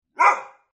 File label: Dog Barks; Dogs; Sound Effects:Animals